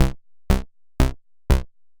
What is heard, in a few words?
step sequencer 8 steps; 4 steps